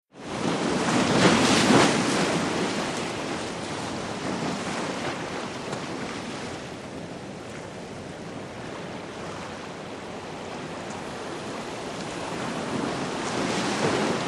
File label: Lht Waves Splashing